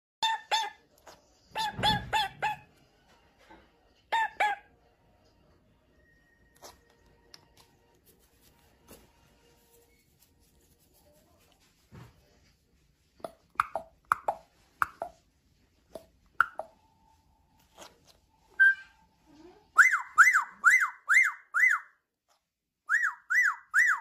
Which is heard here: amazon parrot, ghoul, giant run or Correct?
amazon parrot